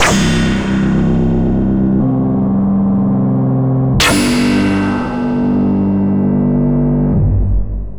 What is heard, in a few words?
Wire Ring, Synth SFX; Sonic Foundry (Sony Creative Software); The Electronic Music Manuscript Collection